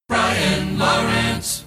jock jingles; pams series 42a

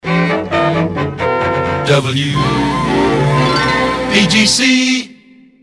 jingles resings long w; pams

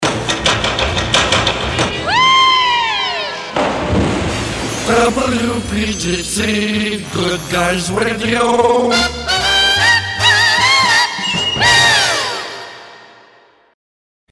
jingles diving board sfx; pams; series 27 jet set

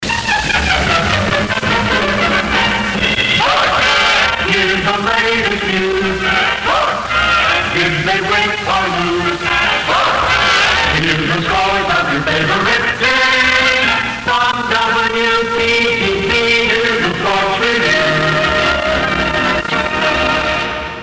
jingles sports revue restored; futursonic

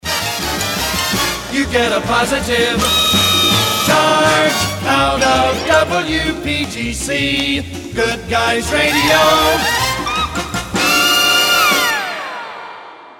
jingles a positive charge; pams; series 27 jet set

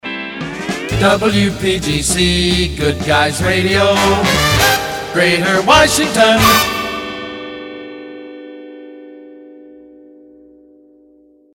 jingles, greater washington; pams; series 27 jet set

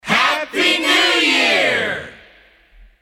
christmas kit group shout happy new year

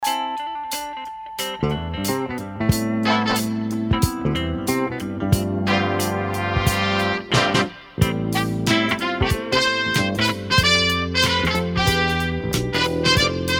christmas kit instrumental beds

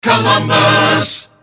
jingles resings jock cut accapella; pams; columbus

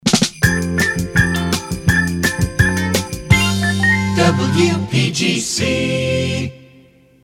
christmas kit jingle bells fast

